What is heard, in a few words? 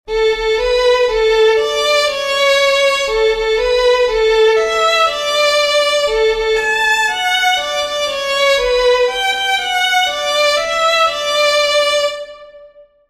HAPPY BIRTHDAY IN KEY OF D, fiddlers